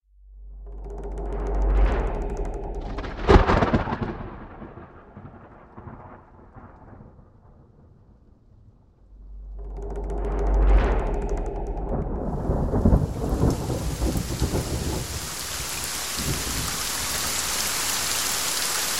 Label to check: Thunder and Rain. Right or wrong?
Right